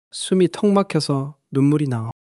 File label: crying